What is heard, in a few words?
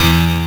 Piano Fury; Mo' Phatt; Digital Sound Factory Mo' Phatt